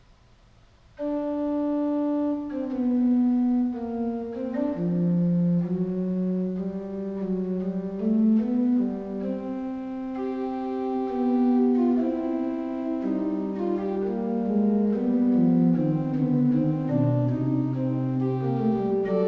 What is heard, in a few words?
Fugue, Organ; Magnificat septimi toni; Johann Pachelbel; Complete Organ Works